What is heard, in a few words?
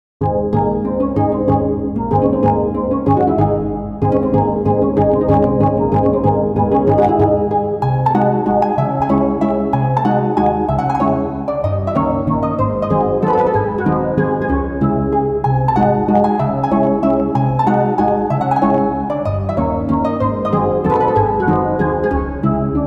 Mazurka; Chopin